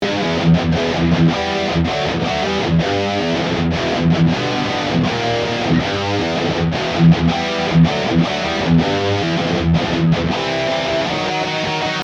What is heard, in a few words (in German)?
Riff Idee erstes und zweites Riff